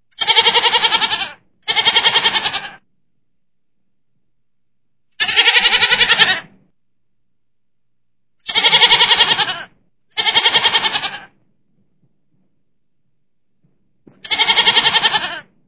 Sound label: Goat Bleeting